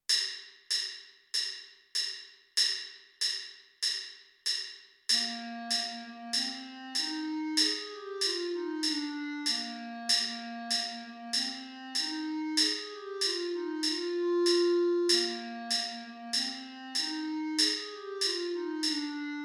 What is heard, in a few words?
clarinet